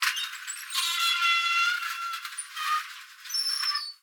Mechanic Squeak

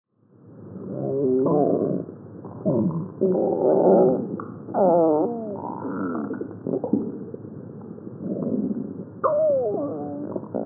Omg Borborygmi 4khz

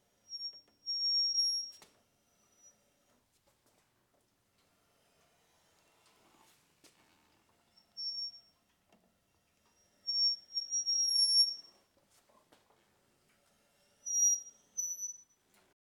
Motorcycle Brakes

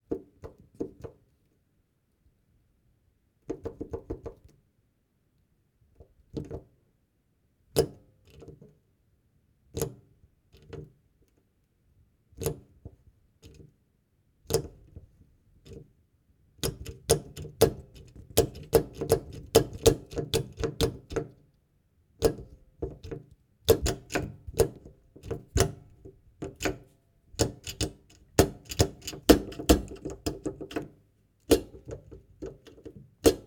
Gas Stove Knobs Turn On With Gas At The End

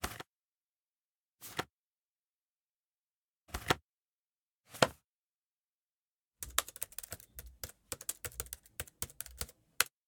Open And Close Notebook

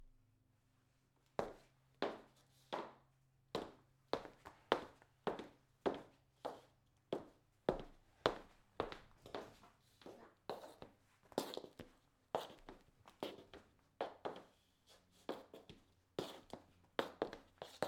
Boots Walking On Laminated Floor

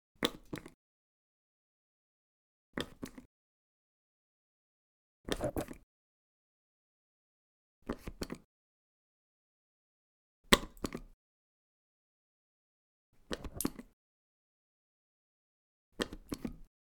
Soap Dispenser

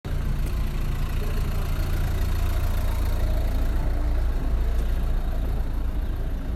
Car Engine

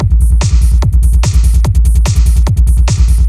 Psy Beat Minimal Psy Sub Beat

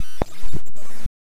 Static Burst

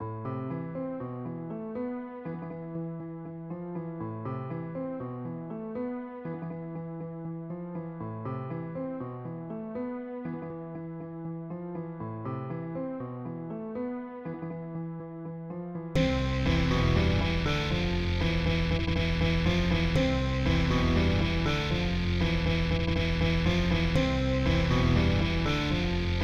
Weird Piano Loop

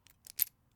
Master Lock Unlock

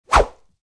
Sword Swishes With Cloth Movement

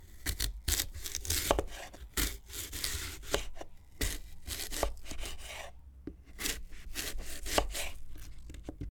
Cutting An Apple

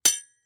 Sword Hit Medium

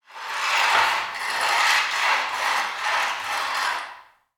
Metal Chair Scraping Concrete Basement Floor

